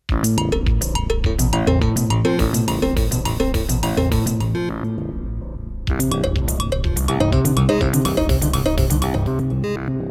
One Note Jam, Roland D-10; Factory Patches

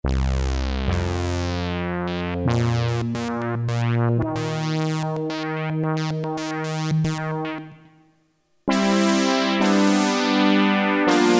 S-H (SAMPLE AND HOLD) BRASS, Roland Jupiter-8; Factory patches

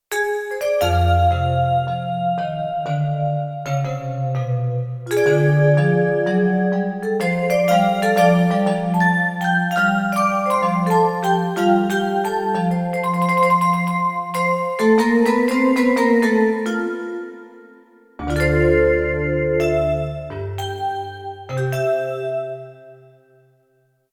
Roland D-70, Factory Performances; Cool Vibes

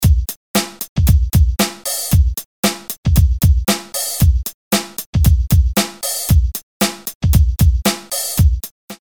LAY BACK, Hammerhead; Bram Bos

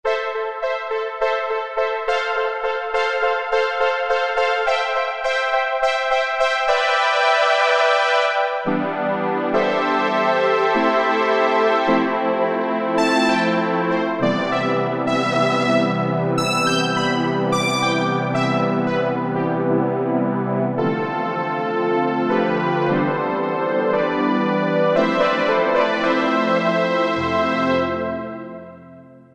SP Annapad, Yamaha TG77; Factory Presets

